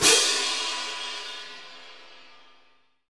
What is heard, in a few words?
CYM PIATTI, Orch Crash; Roland LCDP03 Orchestral Perc; Orch Cymbals